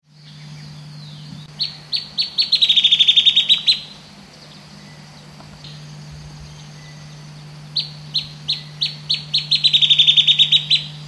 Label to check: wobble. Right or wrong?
Wrong